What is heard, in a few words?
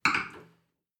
Wine Glass Set Down